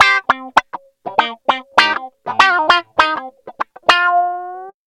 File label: Funk Wah Guitar